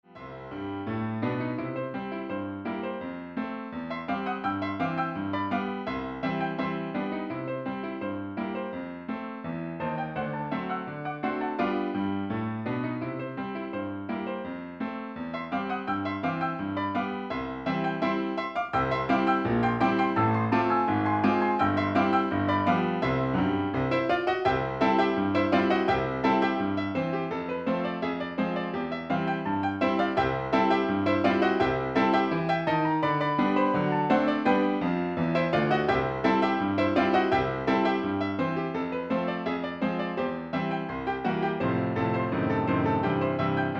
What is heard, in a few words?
Piano Entertainer